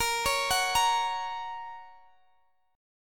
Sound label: Bb Minor Sharp 5th, Guitar, Drop D; x,x,20,18,19,18